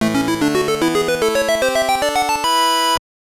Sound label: Jingle, gb; Lazlos Leap